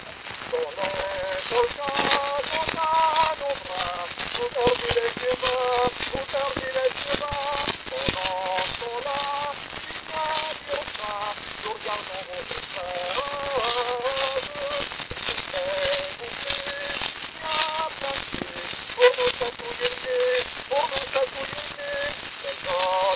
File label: Polka